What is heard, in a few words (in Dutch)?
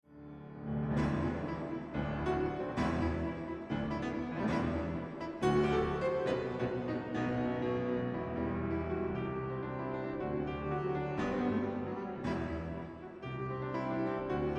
Pianosolo